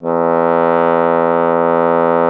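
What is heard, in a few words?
bass trombone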